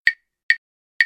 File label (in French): claves